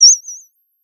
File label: Sound of a bird tweeting nicely